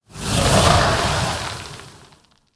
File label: Sandstorm Elemental Attack